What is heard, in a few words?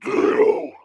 back, monster